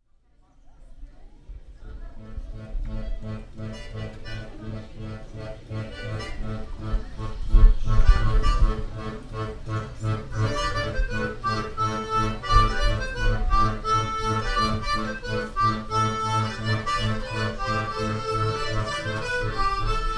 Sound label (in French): hanter dro accordeons; charivari